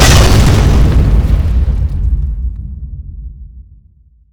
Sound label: echo slam; the monster plane; kolmods